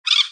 little bird creak in the swamp

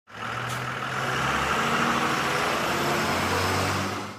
school bus

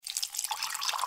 pouring water

